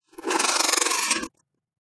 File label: flutter long; CinemaSpice; Mograph Mini Transitions